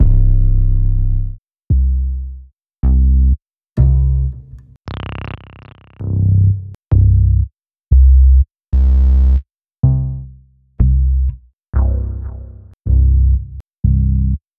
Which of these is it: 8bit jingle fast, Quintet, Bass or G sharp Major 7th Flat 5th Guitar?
Bass